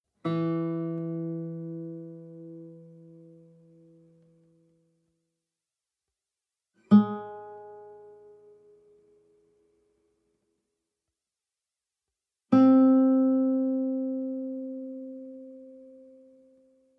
western guitar single note

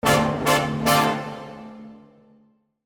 brass timpani accents

